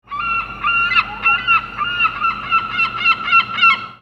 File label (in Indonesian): Sfx camar